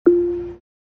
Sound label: SFX AMAZON ECHO FINISHED LISTENING SOUND